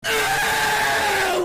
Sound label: eeeewwww; caseoh